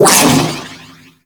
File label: Crowd Dispersion Cast; DE; Warframe; Weapons; Sentinel; Abilities